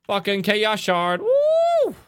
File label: woooooo